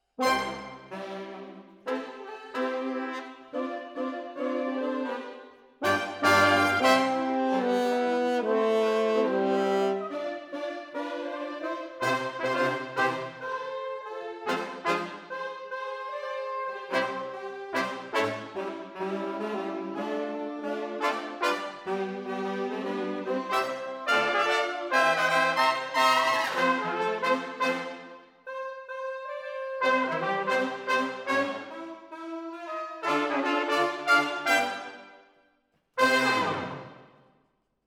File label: Horns Over